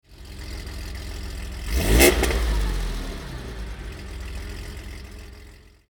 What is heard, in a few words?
Porsche 911 SC, engine; 2003 Skope Classic; Ruapuna Raceway, Christchurch, New Zealand